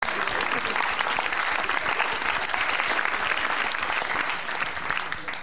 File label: clap; cstrike